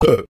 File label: burp